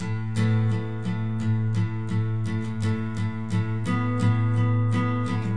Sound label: moody guitar strumming